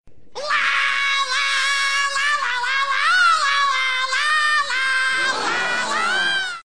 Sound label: Screaming; Spongebob